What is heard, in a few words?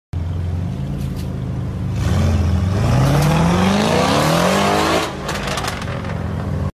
Audi exhaust